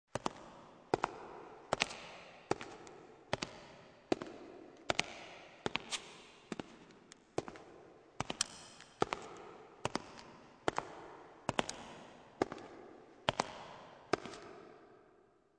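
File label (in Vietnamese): tiếng bước chân đi giày cao gót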